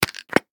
Pill Bottle Open; Medical; Blastwave

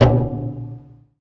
Menu Cancel; Menu FX

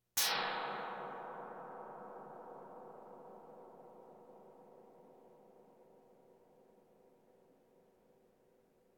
Percussion Bongos Tympani rolls Gongs Drums

